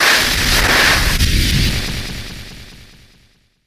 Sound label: Crash Whoosh Impact